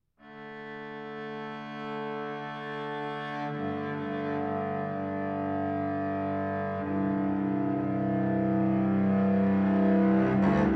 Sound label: trio for cello and digital processor